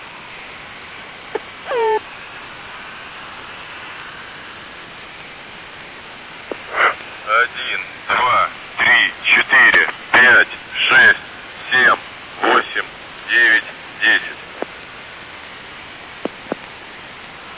airhorn

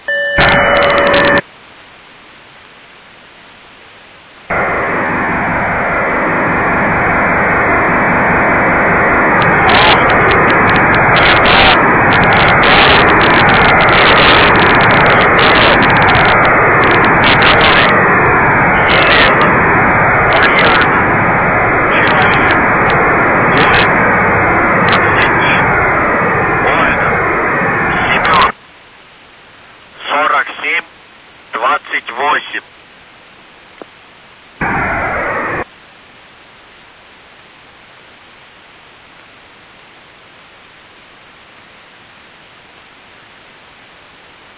airhorn

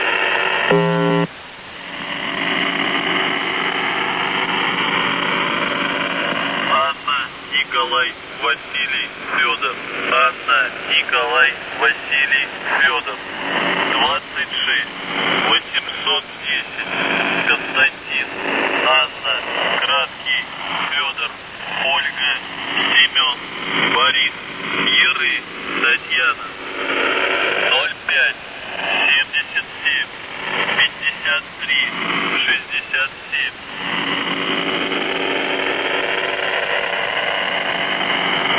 buzzer usb msg

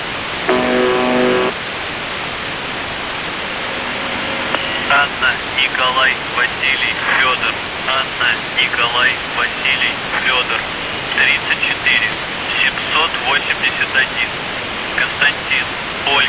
buzzer usb msg

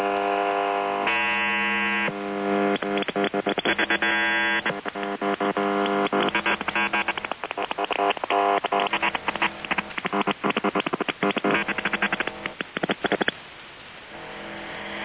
buzzer usb very sick marker

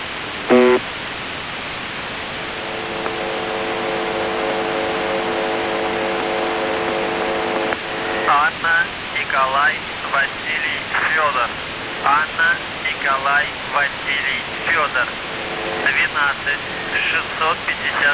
buzzer usb msg

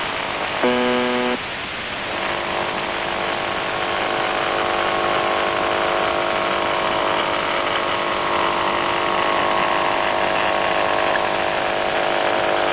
buzzer usb msg

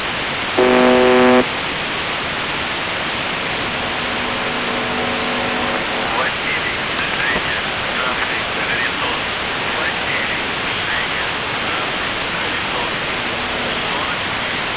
buzzer usb msg

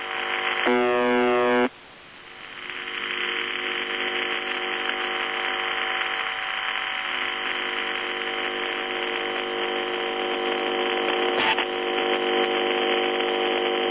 buzzer usb msg

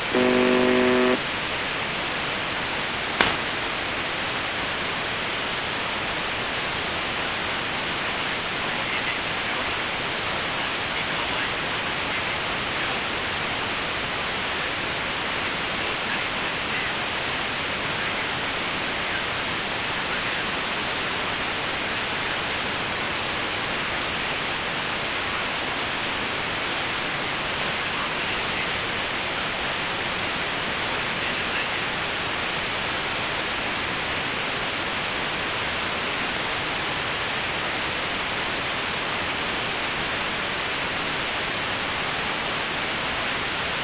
buzzer usb msg

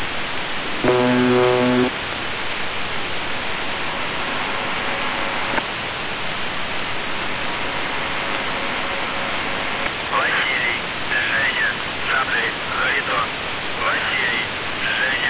buzzer usb msg

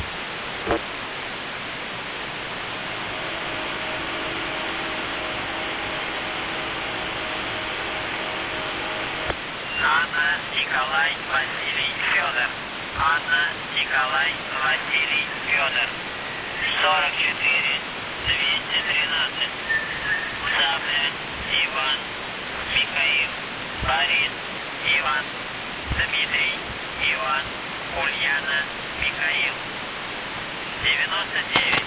buzzer usb msg

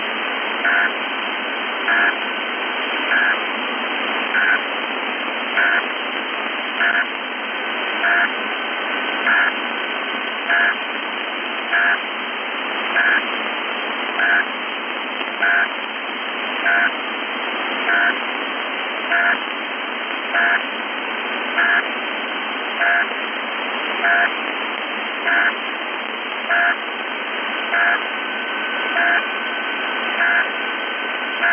usb marker; avare

